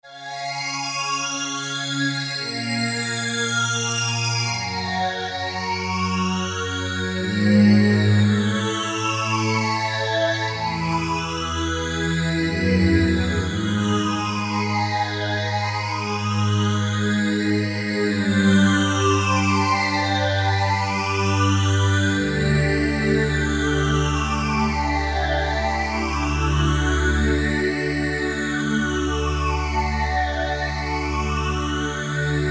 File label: PHASER STR, Roland SC-880; Factory Patches